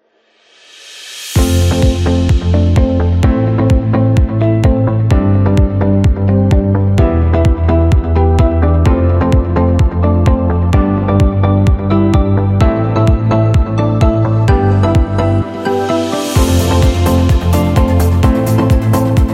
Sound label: Promo Corporate; friendly